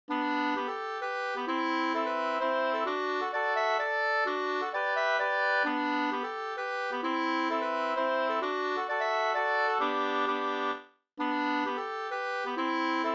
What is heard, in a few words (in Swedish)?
hambopolska; Gavells-Ella fr Hs NSG798 2st